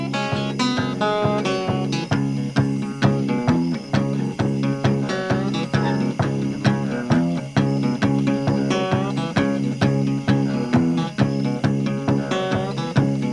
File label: Blues